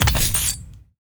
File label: medic morphine injection; war ui class specific; esmteam